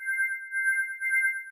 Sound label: special magnet; subway-surfers-ny